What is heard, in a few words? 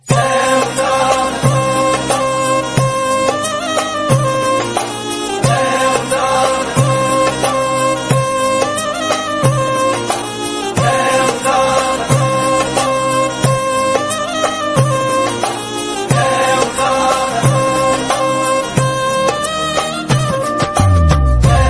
Bgm Ringtone